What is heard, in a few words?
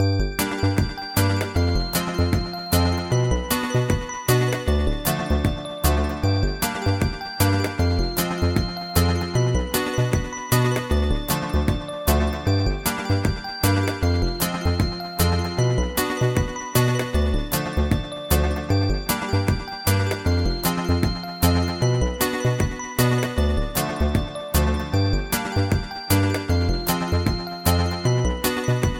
Rasta Bell Ringtone